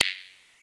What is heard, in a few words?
Blip